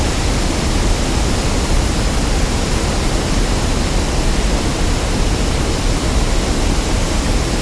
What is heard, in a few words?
Water Fall Heavy Loop; Environment